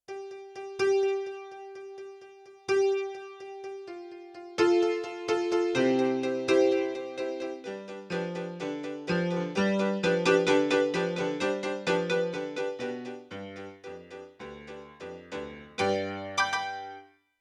Velocity Pan, Yamaha CFIII Grand Piano; Yamaha TX16W

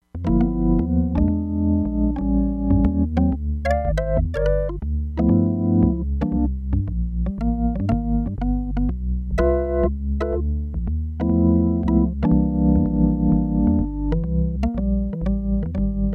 JAZZ PERCUSSIVE ORGAN, Crumar Bit 99; Factory Programs

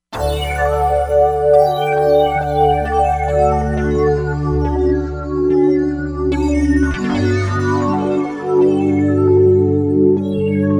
Star Dust, Korg MSC-07S Synth combinations; Korg MSC-07S Synth 2

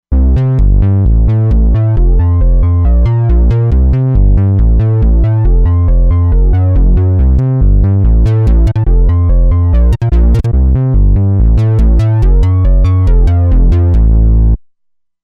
Bass Wave Bass

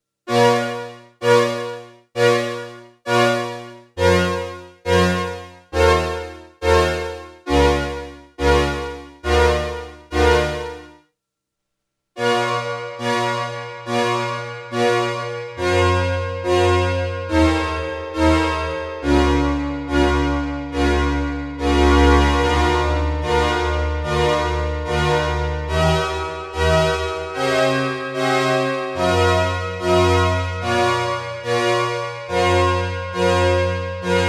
HALL

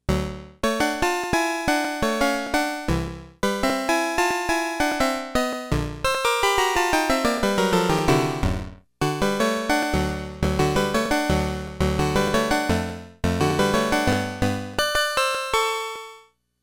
Tenth Decay

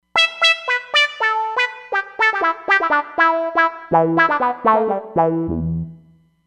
Roland Fantom XR

